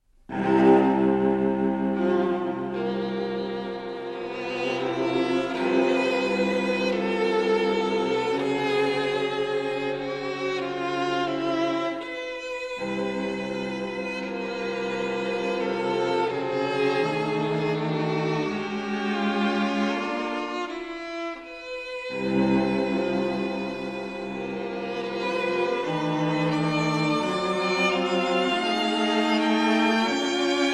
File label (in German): streichquartett